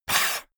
monster hiss flat; koerpergeraeusche; comedy-cartoon; soundpool

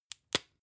spice jar small open; industrie wirtschaft; gastronomie

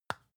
floss open soft; haus-garten; badezimmer

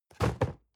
cardboard box medium fall low soft; objekte

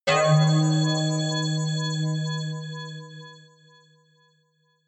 synth hit pulsate high

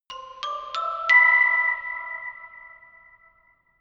notification glockenspiel creepy sequence ascend

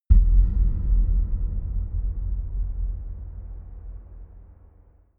dark outer space shadow, hits impacts

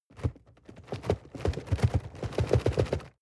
cardboard box medium shake soft; objekte

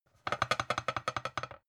pan wiggle; objekte; topf

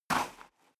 step boots gravel light single right; schritte

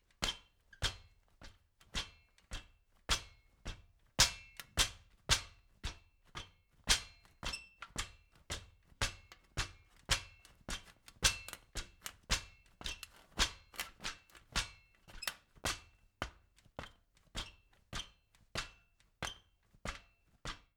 step ladder metal hard; schritte

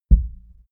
heart beat single low

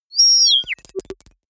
confirm micro click; maschinen; signale; soundpool

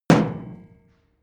metal impact hollow ringing

